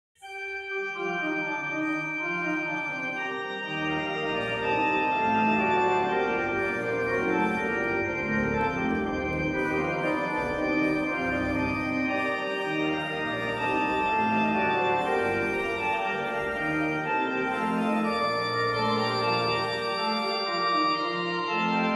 Orgelbuchlein BWV 612; Bach; Wir Christenleut'

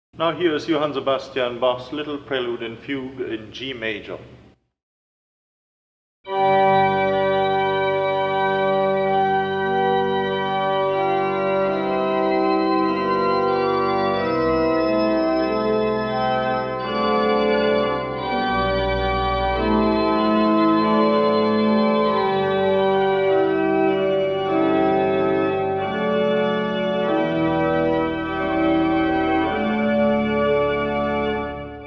Prelude in G Major; Bach; Little Prelude and Fugue in G Major BWV 557

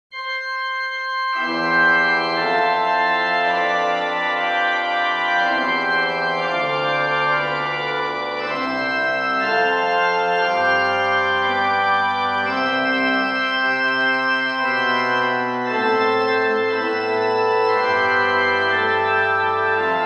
Short Organ Chorale; Dupre; Three Short Organ Chorale 3

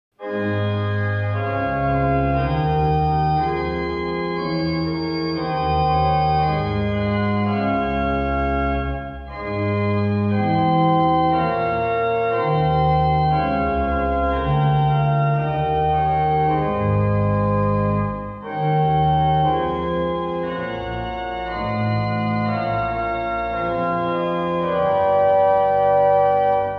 Chorale Preludes; Reger; 30 Short Chorale Preludes; 3 All Depends on Our Possessing

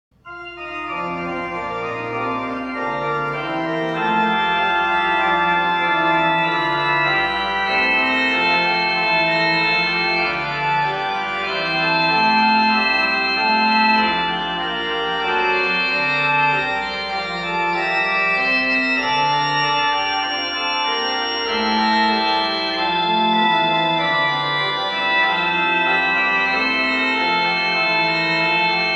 Orgelbuchlein BWV 600; Bach; God Who Through His Great Mercy

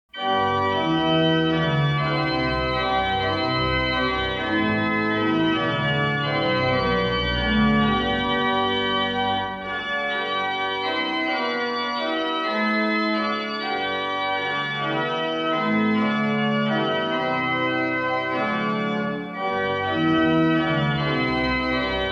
Improvisation

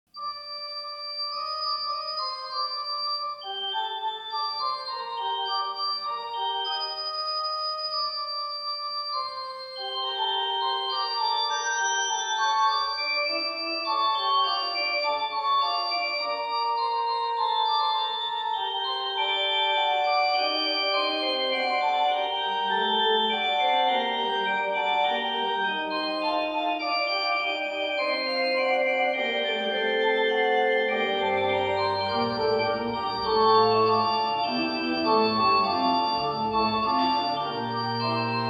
Fugue in G minor; Bach; Little Prelude and Fugue in G minor BWV 558

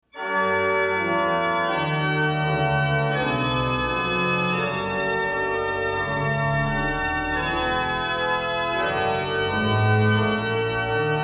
Chorale Preludes; Reger; 30 Short Chorale Preludes; 28 If Thou But Suffer God to Guide Thee

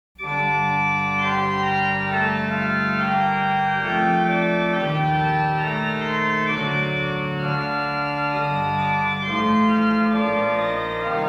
Short Chorale Preludes; 8 Short Chorale Preludes; 7 If Thou But Suffer God to Guide Thee

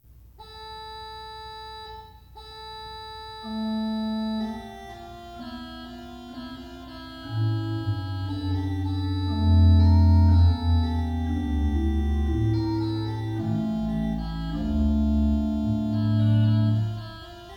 Orgelbuchlein BWV 614; Bas alte Jahr vergangen ist; Bach

